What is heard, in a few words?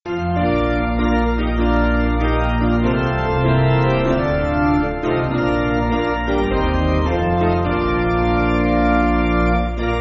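Piano, Music Backing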